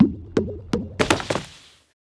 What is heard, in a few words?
Mole die